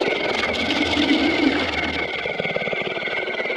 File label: swarm small warship idle; Stellaris; other entities